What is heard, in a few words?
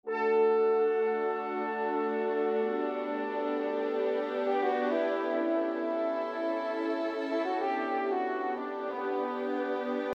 Transition mellow